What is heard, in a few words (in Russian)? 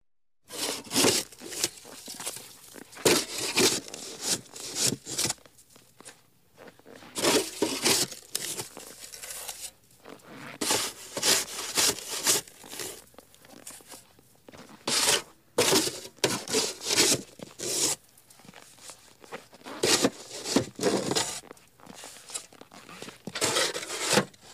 zvuk uborki snega lopatoy skrip obuvi na snegu